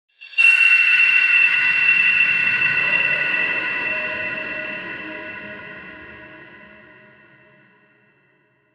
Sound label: cold fizz metallic touch